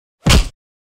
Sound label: fights